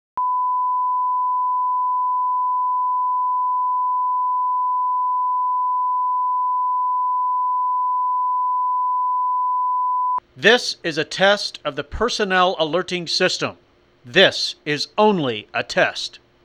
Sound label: Test of Personnel Alerting System